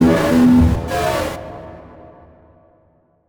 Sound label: Title Transition Communication Distortion; Production Element Title Transition